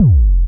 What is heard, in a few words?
Kick; Samplepack Produtique